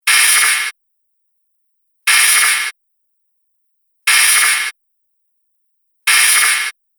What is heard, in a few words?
Metal Laser Loop; Laser Sound Sound Effects; Looping Sound Effect; Andrew Fleming